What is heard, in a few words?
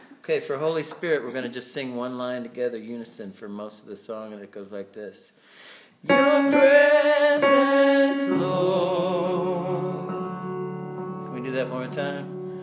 TENOR